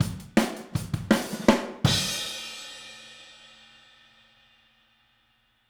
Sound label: Outro Overhead ST, 162 BPM, Drums; Drums from the Big Room The Mixes; Take Your Toms Off